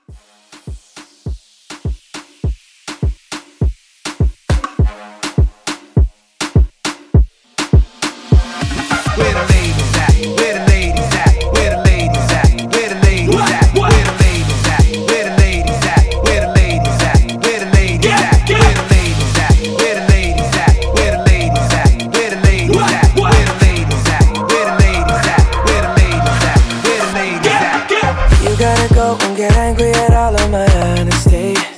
Moombah Hype 102 CLEAN

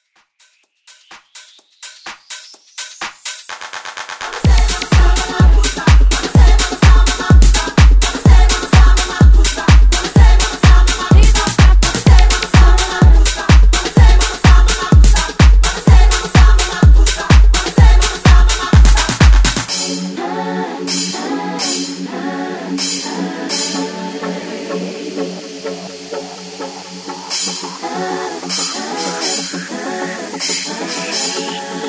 House Vocal

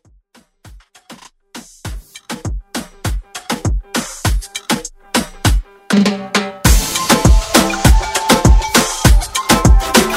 Moombah Redrum

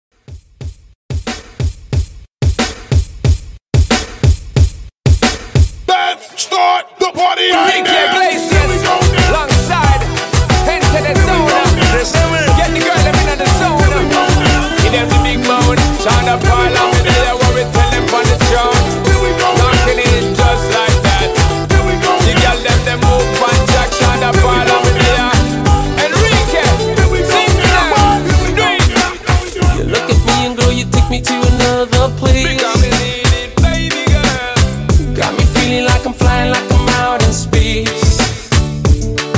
Pop Re Drum 91 BPM

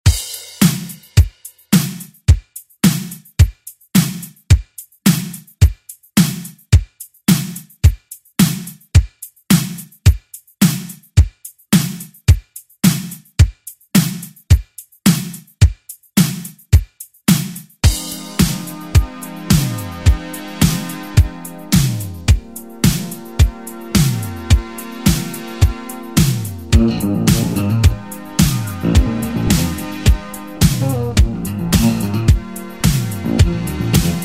80s Re Drum; DJ Mhark

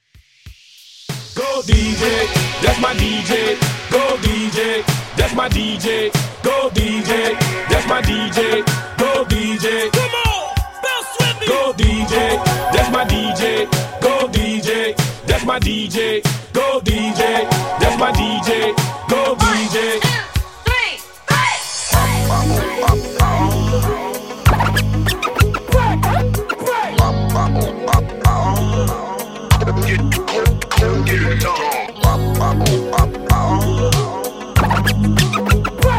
Scratch Hype 95Bpm

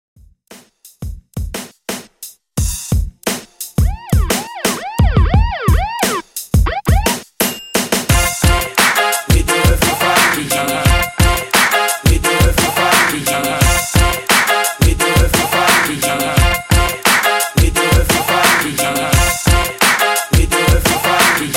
Old School Redrum 87Bpm Dirty

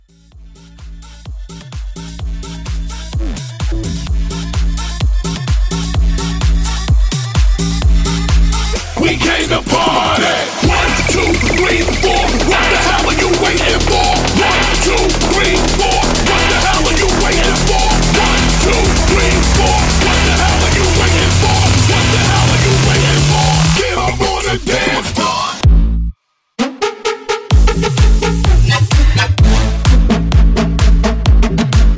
Basshouse Reboot 128Bpm

